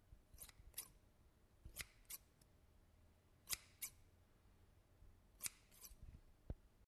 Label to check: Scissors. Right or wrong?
Right